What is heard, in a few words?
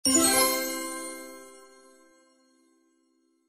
roulette up